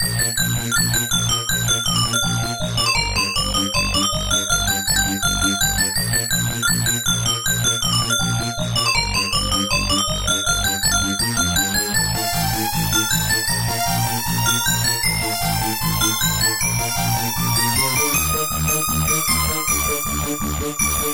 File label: Faster; Bubble Bobble